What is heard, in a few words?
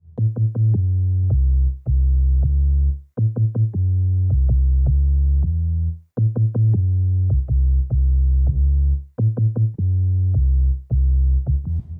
Rezboups Bass; Sonic Foundry (Sony Creative Software); Knutrix Drum & Bass